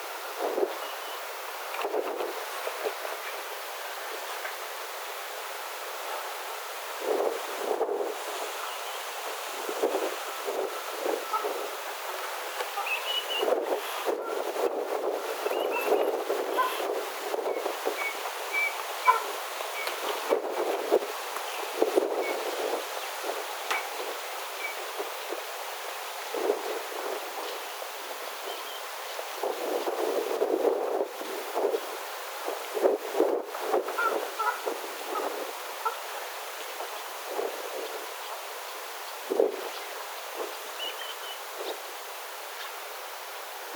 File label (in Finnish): rantakurvin ääntelyä pitkästi; rantakurvin ääntä pitkästi; juuri samaan aikaan saapuvat oranssihaunhulit